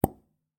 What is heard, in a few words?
Syringe Pop; Rogue Waves; Kawaii UI